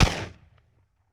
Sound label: Benelli 12 Gauge Shotgun Blast; Weapons & Firearms; Sony Pictures Sound Effects Series Volumes 1-10; Sony Creative Software